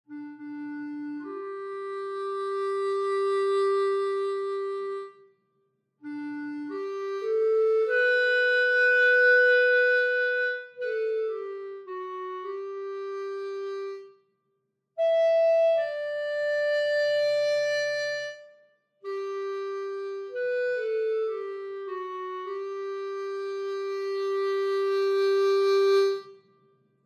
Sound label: Woodwind Solo Clarinet Legato; Artinoise Recorder DAW